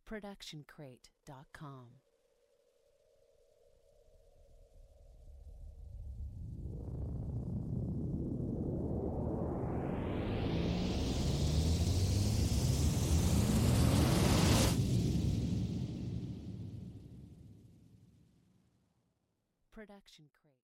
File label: Cinematic Riser